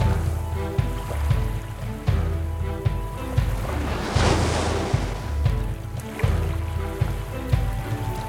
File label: ambience Free Spin; piratefromtheeast